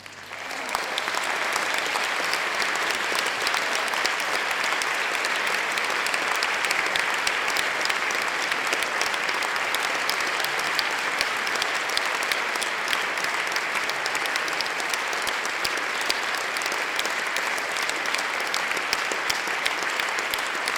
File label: applause clapping church crowd immersive